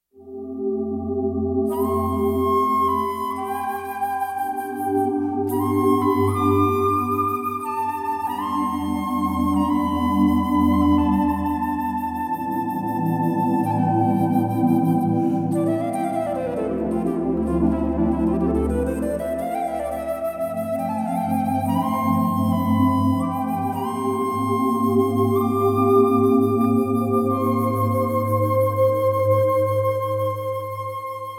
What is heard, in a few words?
Alto Flute